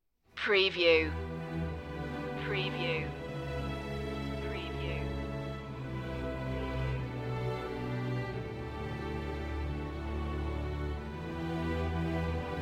intro part strings only

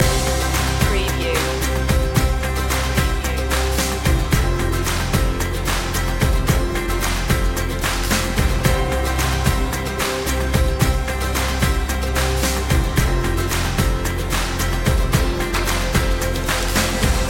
happy to help loop

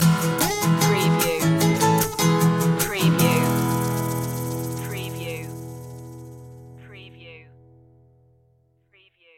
marimba surfer stinger

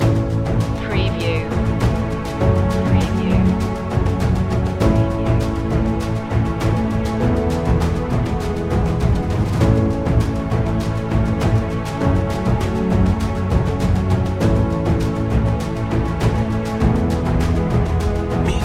inspirational morning loop

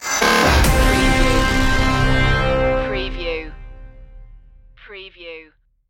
colossus stinger

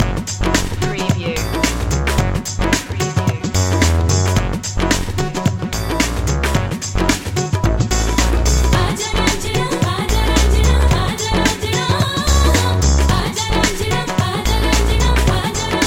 mumbai groove loop

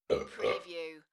Double Burp; beanstalk audio

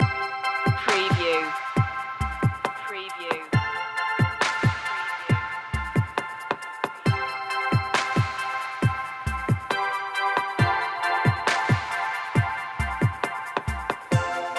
tones of aqua loop

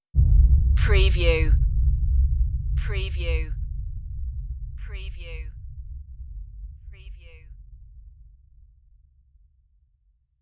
perc stinger

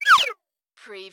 CARTOON SLIDE WHISTLE WIND DOWN

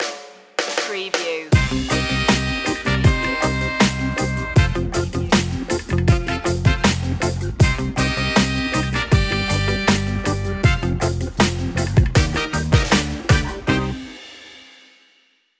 groovy jamaica; 15s

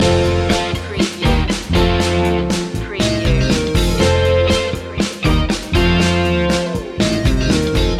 motown sparkling loop

